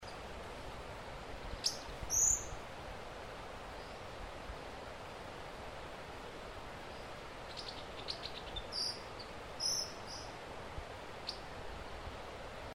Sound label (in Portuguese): Orthogonys chloricterus Catirumbava